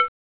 Saw D#3

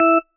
marimba tissue; ゆめ2っき

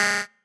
marimba bike; ゆめ2っき

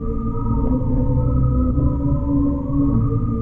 bgm, Music; ゆめ2っき

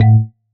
marimba raincoat; ゆめ2っき

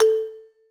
marimba; ゆめ2っき

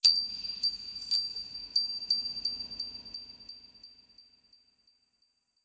ding

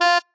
marimba bat; ゆめ2っき

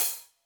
marimba drum; ゆめ2っき

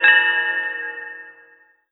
chime

